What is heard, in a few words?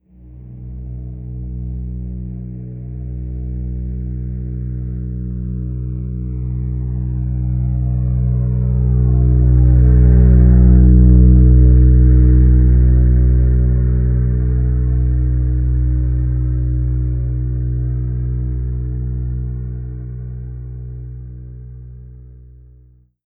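CARGO PLANE; AKAI Anime Sound FX; NATURE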